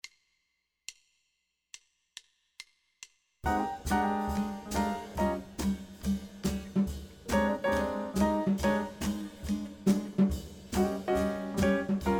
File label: Blues Bass